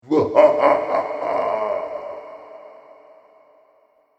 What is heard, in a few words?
Tyrant Laughter, evil laugh; fantasy sound effect